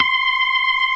ORGAN, C3 NO PERC; Keith Emerson Signature Series

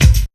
ROCK 132 BD, Big Beat Drumloops

